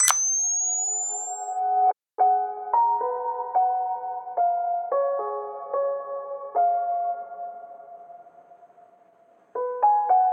Soft Piano

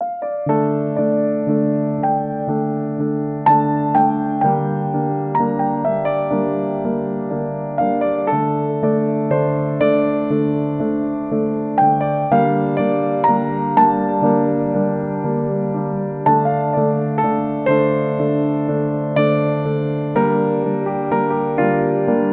Misery Loop

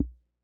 Wood

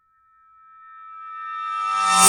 Reversed Pot

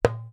Djembe Hit Center

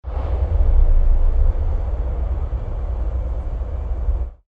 Tension Sting

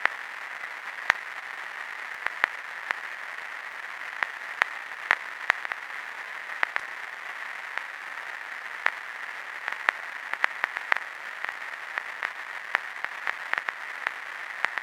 Noisy Vinyl Crackle

